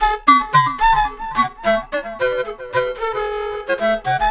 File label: Flute Effect, effected flute; Sonic Foundry (Sony Creative Software); Jazz Solos and Sections